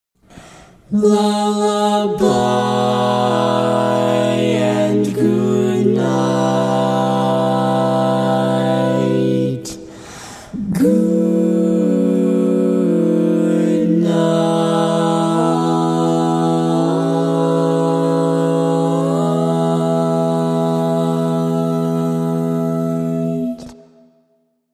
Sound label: Lullaby and Goodnight, All Parts, barbershop tags